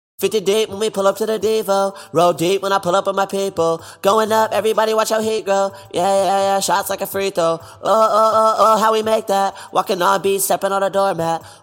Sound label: hip hop 126 Am, Acapellas